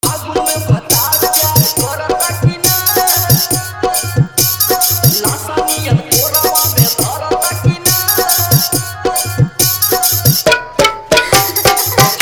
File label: Hard Bass Mix